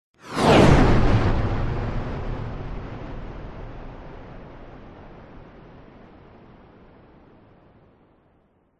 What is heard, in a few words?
airplane fighter jet pass by low close extreme fast speed; vehicles; transport; whoosh